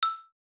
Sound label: bell pitch; fallguys